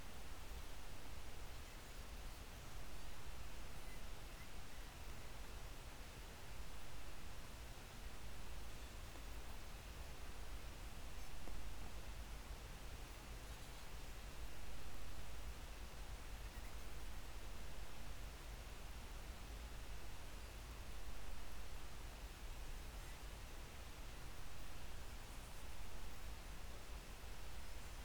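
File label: hydrophone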